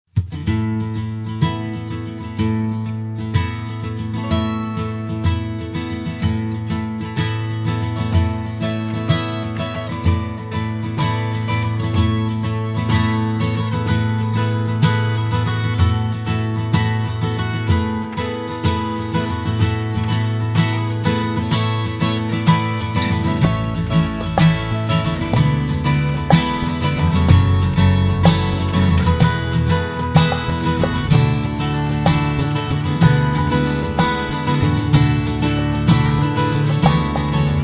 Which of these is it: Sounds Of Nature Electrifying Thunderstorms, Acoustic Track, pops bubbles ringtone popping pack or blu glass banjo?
Acoustic Track